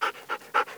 Dog mov Start

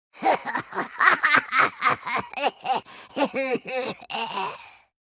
laugh

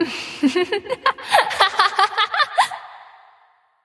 Laugh; Seraphine; 萨勒芬妮; 英雄联盟; 星籁歌姬

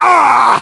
hurt voice

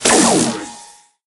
zappy shot

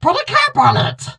hurt

